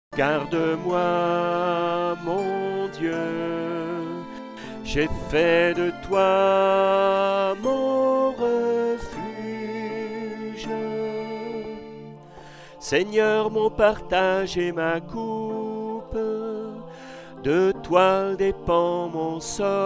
chante Entier; GARDE-MOI MON DIEU

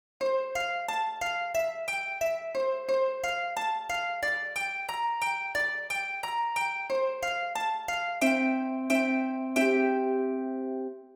harpe; Tu Es Pretre a Jamais

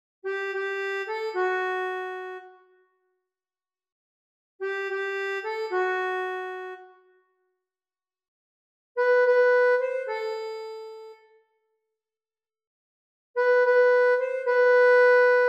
ACCORDEON

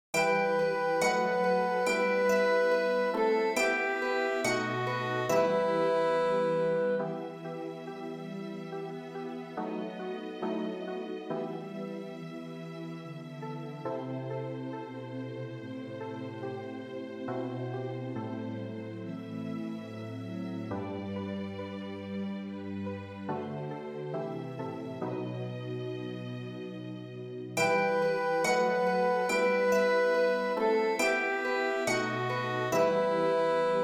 ORCHESTRE

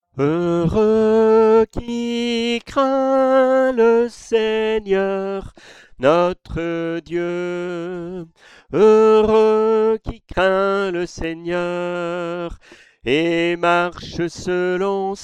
Psaume CHANT

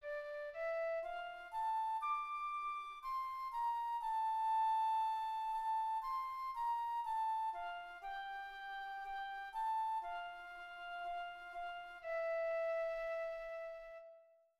FLUTE; PSAUME 31

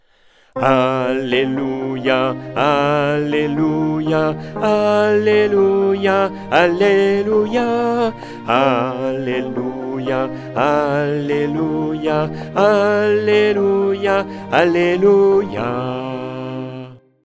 BASSE; ALLELUIA colombiere

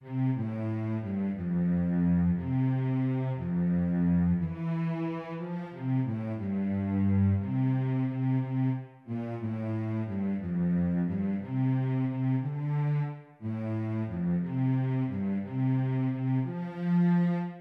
VIOLONCELLE; KantikSantYan

